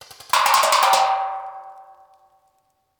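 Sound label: Paintball Gun Impact; Sound Ideas; Guns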